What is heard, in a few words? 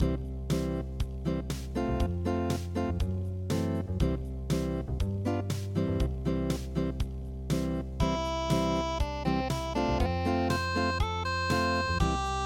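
swanee river unplugged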